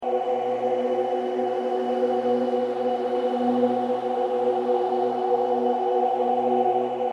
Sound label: Cutscene ambience loop